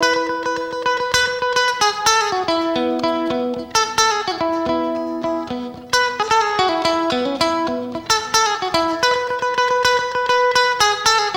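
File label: TOWNSHIP, S. AFR. GTR; Spectrasonics; Heart of Africa 2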